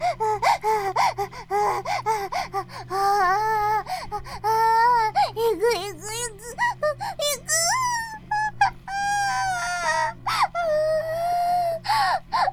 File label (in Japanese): バイブ放置; イクたびにわけて